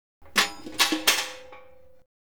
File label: Marble Bucket